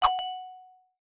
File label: banner card in